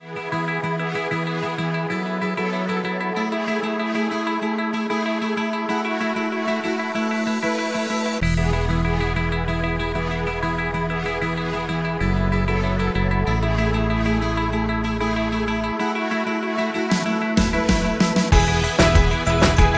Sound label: Pop BPM95